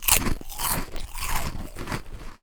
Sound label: Eating; Zomboid 41.78.16; Project Zomboid